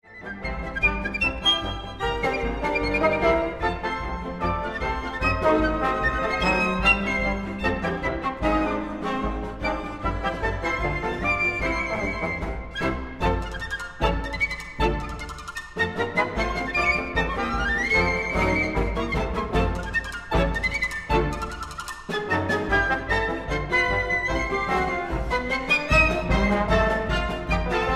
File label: Ragtime Orchestra